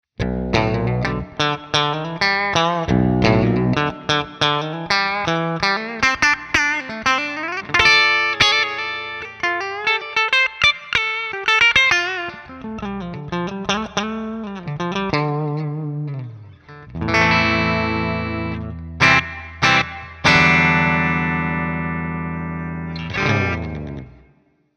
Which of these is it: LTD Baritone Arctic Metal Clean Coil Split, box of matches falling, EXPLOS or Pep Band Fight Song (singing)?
LTD Baritone Arctic Metal Clean Coil Split